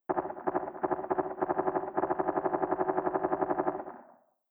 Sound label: fog shooting